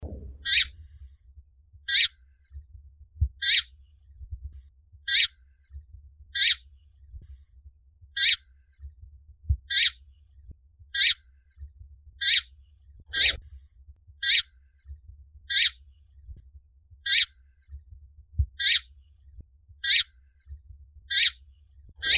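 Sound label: rosefinch, Carpodacus rhodochlamys; chechevitsa archovaya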